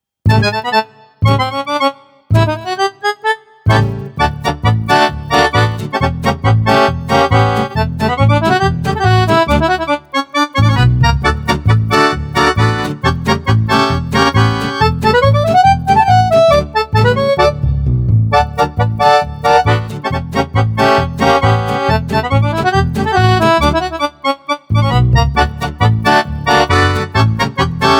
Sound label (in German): Akkordeon HOHNER